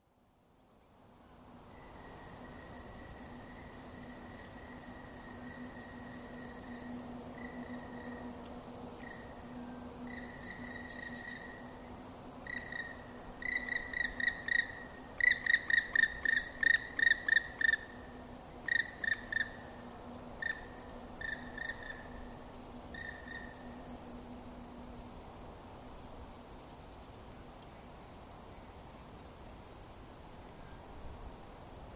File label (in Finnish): palolento, linnut